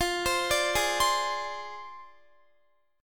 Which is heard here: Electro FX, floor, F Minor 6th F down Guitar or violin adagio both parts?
F Minor 6th F down Guitar